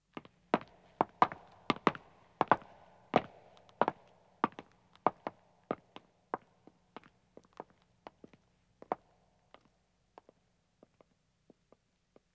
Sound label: Stone Walking, 2 Men Depart, footsteps; BBC Sound Effects Library; Footsteps II